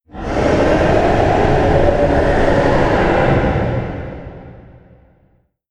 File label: roar distant; lagiacrus